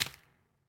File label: footsteps nest; Fate The Cursed King